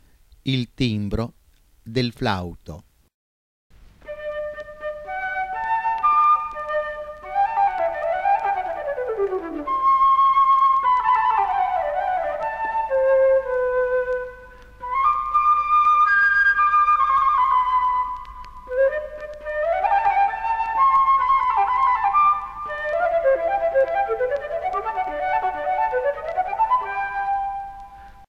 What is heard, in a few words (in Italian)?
Flauto, il timbro del flauto